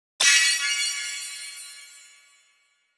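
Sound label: Sound Effect Result Rank 3rd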